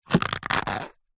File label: balloon skin stretch; foley